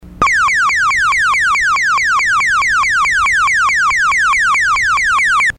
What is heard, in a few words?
alarm; Plectron Alarm Warble, directly off a G8 encoder